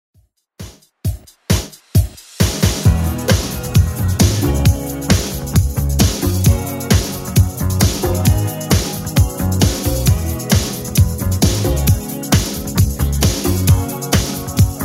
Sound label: 80s Redrum, 133 bpm